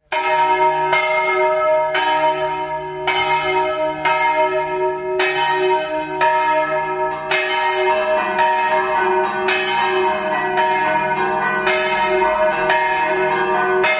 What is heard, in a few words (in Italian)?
campane; gurise